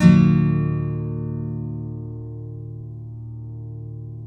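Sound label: nylon up minor E, guitars, flam chords; Spectrasonics; Hans Zimmer Guitars VOL-2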